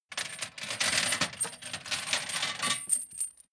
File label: toolbox handling